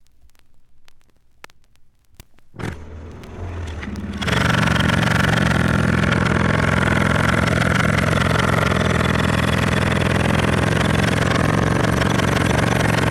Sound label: Air Hammer And Compressor